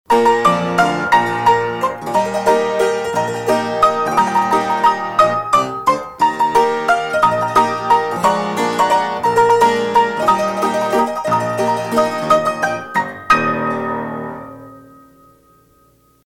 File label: Rinky Tink Piano; PAMS; CKY